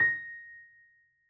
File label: piano